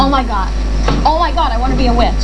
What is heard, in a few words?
witch